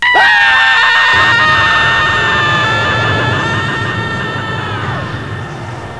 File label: Scream